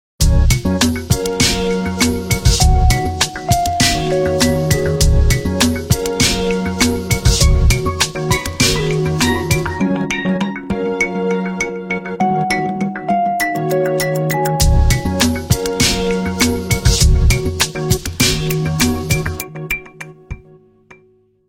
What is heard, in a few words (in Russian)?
Nokia 808 originalnye ringtony i uvedomleniia